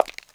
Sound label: Footstep, household rhythm samples; Hits